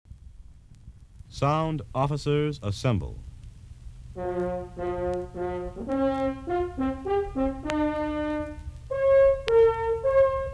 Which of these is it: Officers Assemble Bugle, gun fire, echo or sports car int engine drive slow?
Officers Assemble Bugle